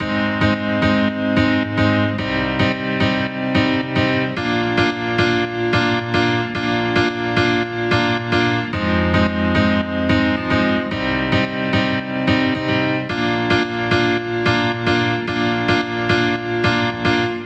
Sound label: Piano G# 110Bpm, House; Ghosthack Advent Calendar; House Kit; House Construction Kit